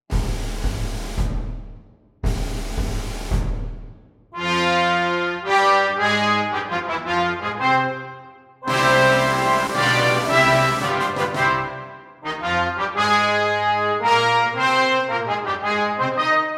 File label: tutti; Canterbury Engineers